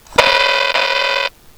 Ding ding

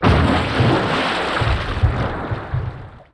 ice splash

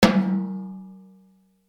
unmuffled no wires cob hoops

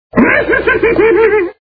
laughing; yoda

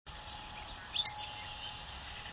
kingbird four part song; birdsongs

